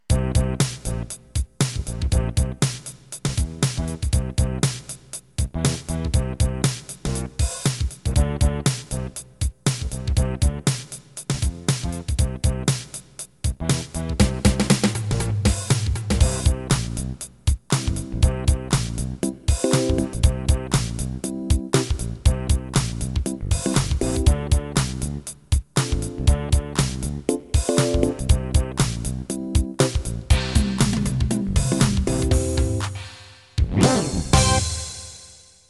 Rap

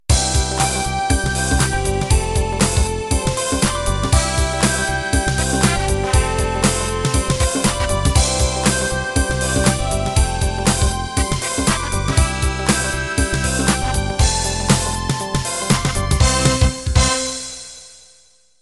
HipHop End

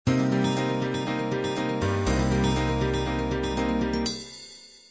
soft beat intro

